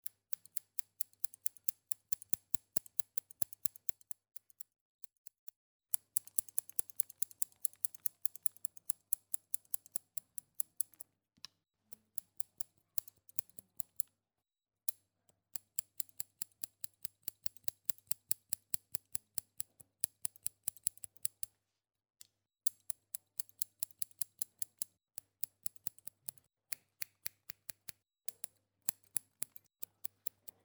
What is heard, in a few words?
hair cutting